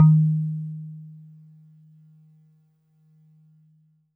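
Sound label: Warble Celeste DX100 D#0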